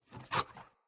Puppy Idle Snort; Kubrow

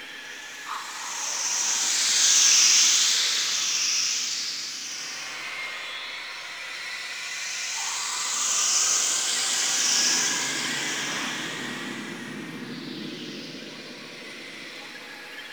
Ext One Bed A, exterior ambience; Warframe; Sentient Devourer

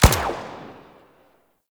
Rifle Fire; Warframe; Venus; Venus Jackal; Weapons

